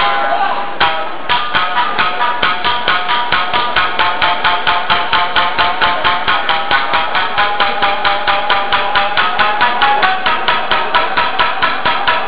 Shamisen; Banquet

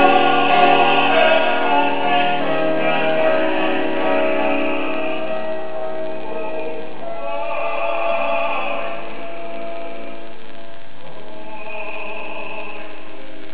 Singer; Tokyo Concert

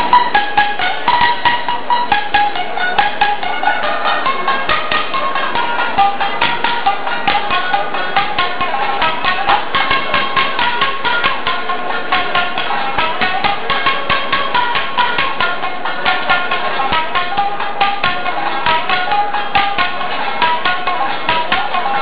Shamisen; Banquet

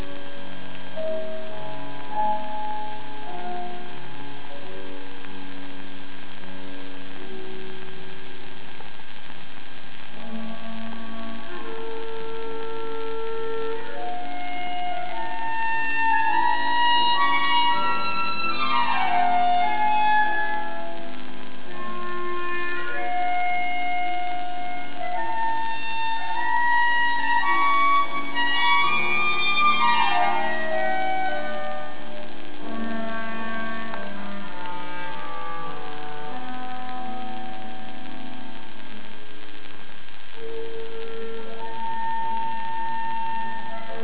Girl Clarinet; Tokyo Concert

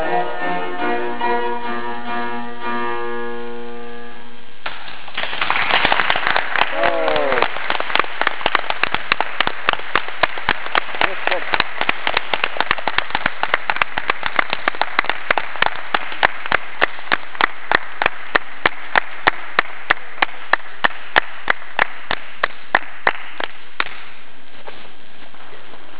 Bravo, concert

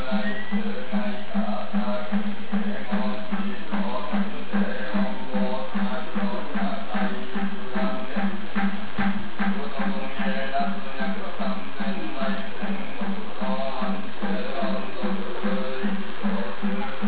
Temple Chanting, Kyoto

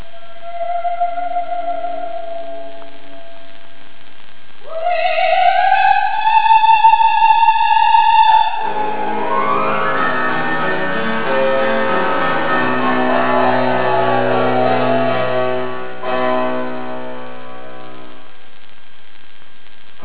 Blue Singer; Tokyo Concert

